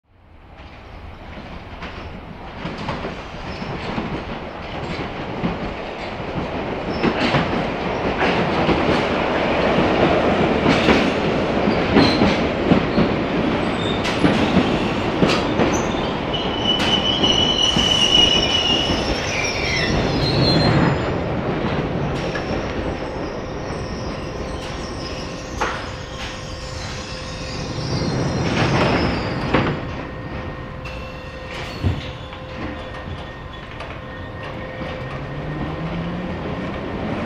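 Train In Stop Away Exterior, Underground Train Above Ground.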